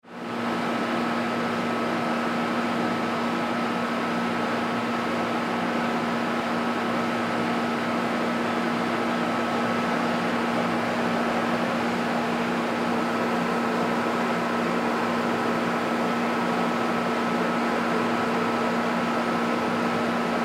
Pool Pump Airy Rumble; Machinery (Sound Effects:Machines); Blastwave

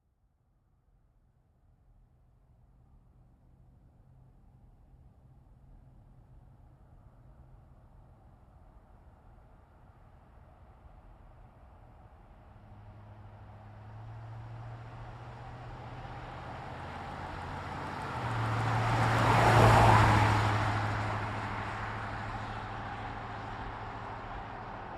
Truck GMC Savana By Med; 2010 GMC Savana; Trucks (Sound Effects:Vehicles); Big Room Sound

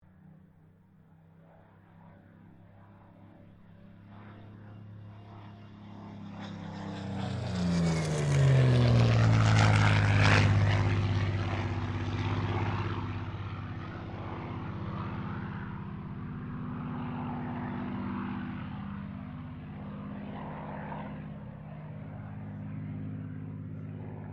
AIRCRAFT SUPERMARINE SPITFIRE MK-XVI PASS

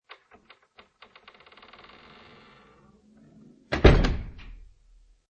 Heavy Door Creak And Shut Without Reverb; Heavy Door Creak. Creaky door opening with heavy hinges creaking; Creepy Door

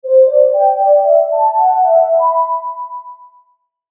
Logo Arpeggio Ascends Melody; Sonic Logo; Blastwave; Sonic Logos; Production Element Imaging Element Accent Transition

